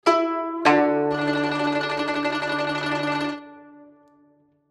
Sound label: Guzheng Ensemble; Phoenix Orchestra